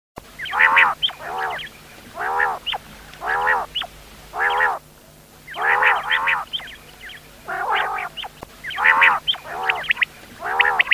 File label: bedana sadoi bedana sayrashi quail hunting sounds quil sounds